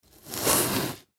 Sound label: Cardboard Box Open Lid; Cardboard (Sound Effects:Materials); Foley; Blastwave